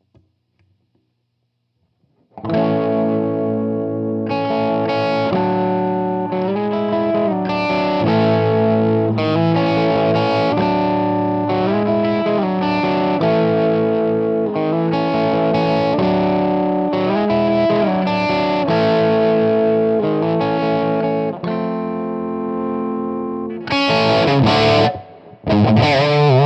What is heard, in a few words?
DSL PT Crunch, Marshall; Marshall DSL Transformers